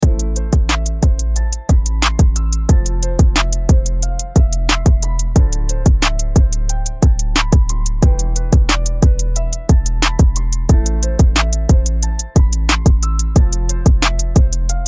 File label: launchpad loop ii